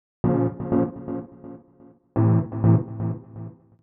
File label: DEEPHOUSE ORGAN 125 A